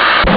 goal; Amiga Soundtracker Sample Packs

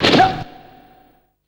FX, Drum & Bass

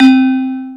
gender; SN-R8-07 Mallet

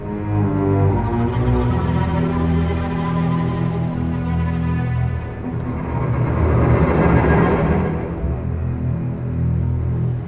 Cinematic Strings, Orchestral

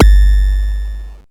BD, Mutable Instruments Anushri

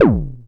FX A, ANALOG; Sound & Vision - Gigapack II

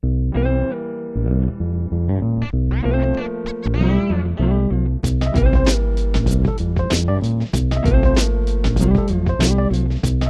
96BPM, Hip Hop; InVision Interactive; 40 Oz Phat Slammin

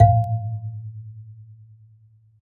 AFRBASMP, BASSE AFR; Univers Sons - Raricussions

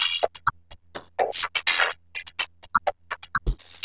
Granular, LOOP 125 BPM; Bolder Sounds - Granular

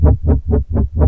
Korg, FX

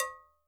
COW MID, Percussion; Sampleheads; NYC Percussionworks; ST PERC VOL1

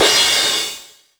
Bass Crash, Jomox Airbase99, Drum Machines

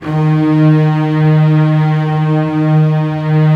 STR SEC, STRINGS; Roland - LA Composer vol. 1

